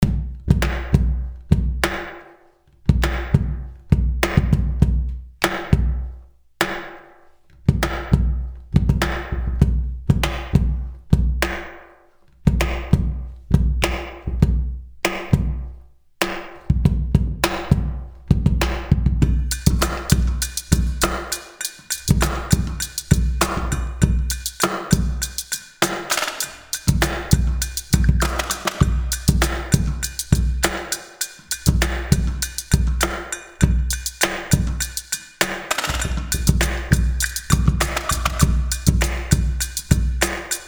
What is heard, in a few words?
Trap Beat Drums; SMILF TV Series